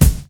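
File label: KICK, DRUM KITS; InVision Interactive; 40 Oz Phat Slammin Hip Hop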